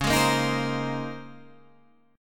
A sharp Minor 11th

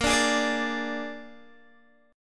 Bb 7th Bb Guitar Open D, chord; 20,17,18,20,17,x

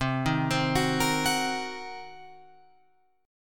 C Minor 7th Flat 5th C down Guitar Open F; Cm7b5 arpeggiated; JGuitar

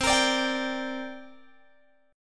C 7th Suspended 2nd, Guitar, Open G

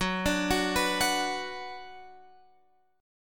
F sharp Suspended 4th Sharp 5th, Guitar, Open F; arpeggiated; x,13,14,13,14,13

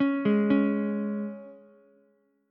Db 5th, Ukulele; arpeggiated; 6,8,9,x; JGuitar

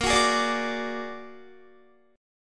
Bb Augmented 7th Bb down Guitar 4E,4A,5D,5G,5C,6F; Bb+7; strummed

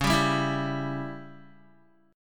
Db Diminished Db down Guitar; strummed; 4F,4A,5C,5G,5C,6E

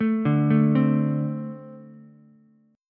A-Minor Double Flat 5th A Ukulele; arpeggiated; JGuitar

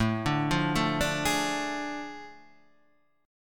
A Major 7th Suspended 4th Sharp 5th; AM7sus4#5 Chord {5 5 3 2 3 4} arpeggiated

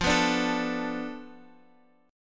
F 6th Flat 5th F down Guitar Standard, chord; F6b5 strummed

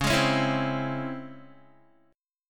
Db Minor Major 7th Db Guitar; DbmM7 strummed; JGuitar

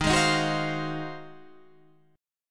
Ab 13th Eb

